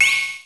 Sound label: menu ok; Pump It Up Exceed